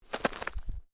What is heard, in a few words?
Clothing Jacket Sleeve Flap; Movemement sounds for various articles of clothing; Dressing; Human